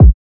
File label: kick; FL Studio; xander brown drumkit